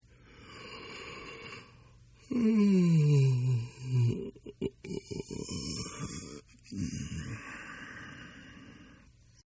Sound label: Yawn; Yawning; Voice; Partners In Rhyme